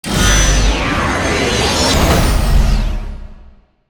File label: Tap; Yu-Gi-Oh! Master Duel